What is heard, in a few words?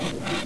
SQUEAK; Matrox M3d